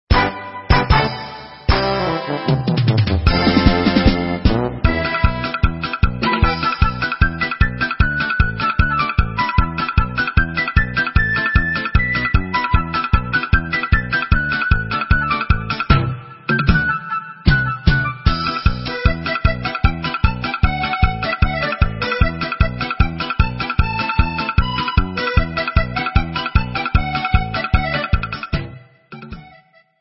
Instrumental

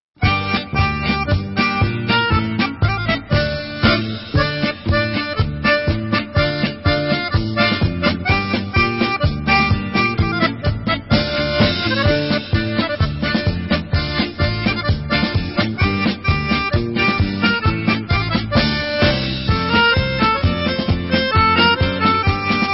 Instrumental

